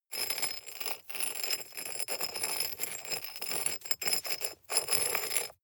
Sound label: scrape stone shard medium